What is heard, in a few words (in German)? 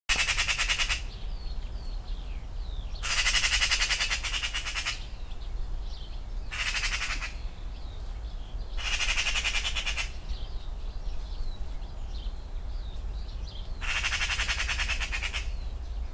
Elster